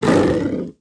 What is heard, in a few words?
Rumble Underlay Death